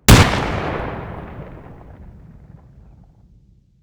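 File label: grenade explosion distant; Escape from Tarkov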